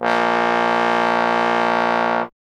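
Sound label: Bass Trombone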